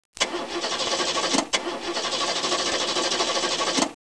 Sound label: startcar